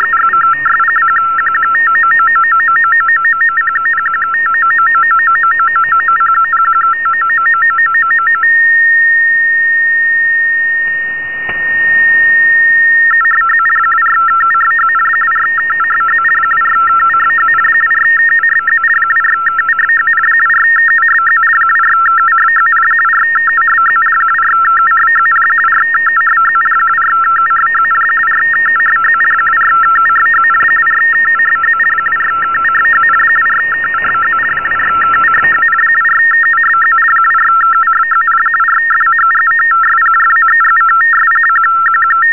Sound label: fsk2 500hz 50bps